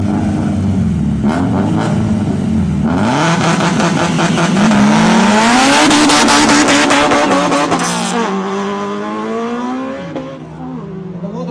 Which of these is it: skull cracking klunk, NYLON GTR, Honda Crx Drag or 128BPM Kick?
Honda Crx Drag